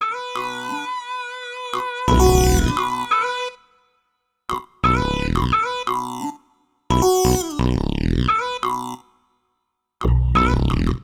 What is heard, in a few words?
HipHop Combo Bb min; Digidesign; Pro Tools Essential Loops